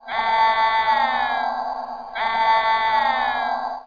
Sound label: alarm